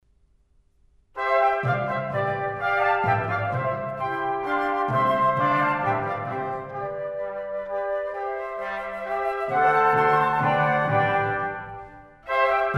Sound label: Divertimento in C Menuetto, Wind; Mozart; Serenades and Divertimenti for Wind